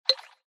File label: btn Click; 1casino-sugar-cards